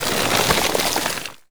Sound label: frost; Tiny Race